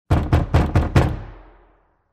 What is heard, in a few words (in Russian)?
derevjannaja dver s jehom; Звук стука в дверь для сказки; Для детей, для детских постановок